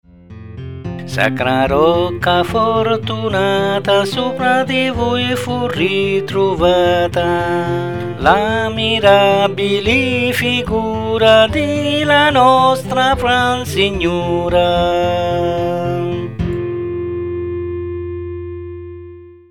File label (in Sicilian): rusariu vausu voce strum; canzoni religiose